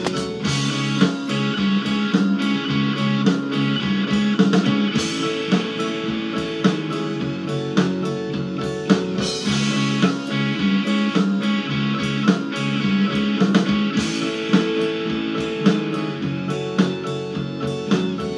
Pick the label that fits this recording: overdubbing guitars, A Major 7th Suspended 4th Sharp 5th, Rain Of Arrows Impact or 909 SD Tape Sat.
overdubbing guitars